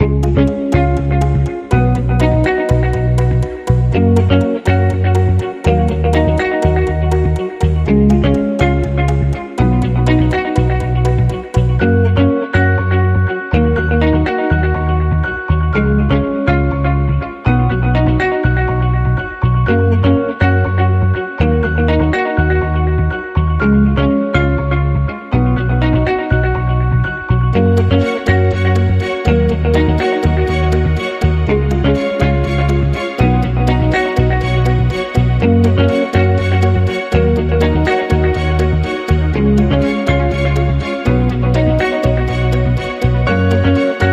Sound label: Smarter Ways Happy Days, No Drums